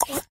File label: Button Menu Show; Candy Crush Soda